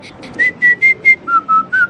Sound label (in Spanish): Chiflido señorial